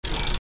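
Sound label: ratchet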